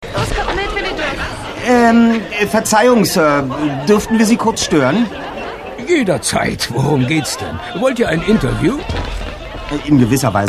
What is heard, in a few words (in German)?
partylaune